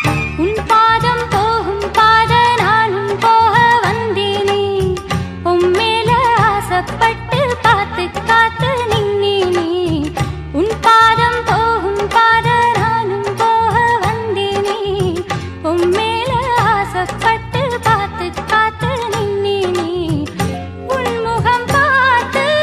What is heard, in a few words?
80 To 90 Tamil Ringtone